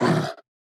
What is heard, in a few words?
Attack; Folk Hero